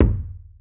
wet kickdrum g sharp key

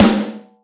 boomy snare drum sample g sharp; snare drum one shots

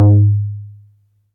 subtle reverb tom one shot g sharp

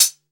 studio hat sound g key

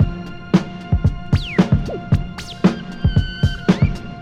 114 bpm drum loop f key

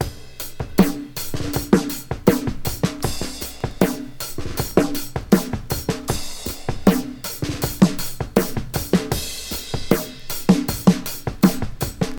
79 bpm fresh breakbeat sample g sharp key; drum loops

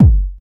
puffy kickdrum g key; kick drum samples

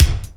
00s acoustic kick single shot d sharp; kick drum

